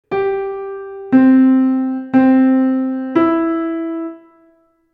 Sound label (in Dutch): bijen melodietje